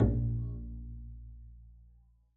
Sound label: pizz bb1, double bass; dsmolken